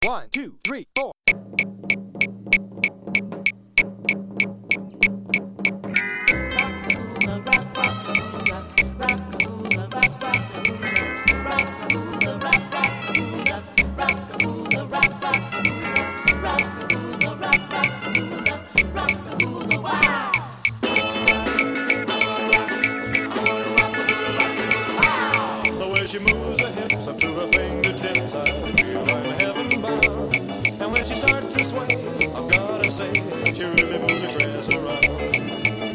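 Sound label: Band Rehearsal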